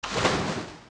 Splash Out; Link splashing into and out of water

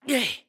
Throw; BOTW; Breath of the Wild; Link

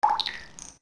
Biggoron Eyedrop; zelda; OOT

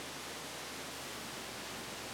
Nature Noise; Zelda; OOT

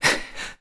Stamina Low; running with slightly low stamina; Link; Skyward Sword; zelda

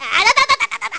Hurt Shock; Link; zelda

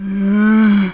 Sigh; Sera sighing

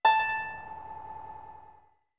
Harp A long; OOT

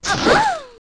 Comb Hit; OOT

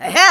Wake Up; Blacksmith's Wife (Lorule) waking up Link; zelda

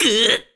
Link Carry Stamina Very Low; Skyward Sword

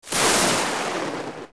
Ball Hit; Phantom Ganon; OOT

